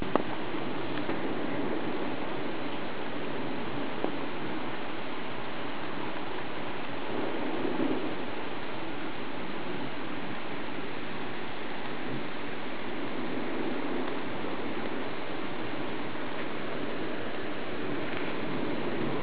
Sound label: thunder